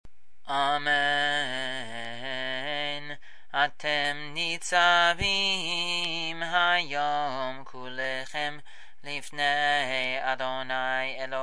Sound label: 1st Weekday Aliyah D Minor; Nitsabim-Vayelekh